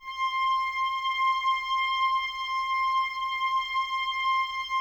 OBOES C, Woodwind Brass Ensemble; Miroslav Vitous

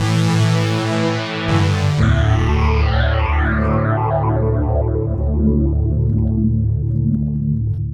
Noisy Gate, Synths

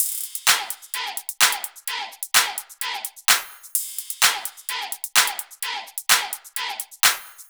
Loops 128BPM, Drumloops

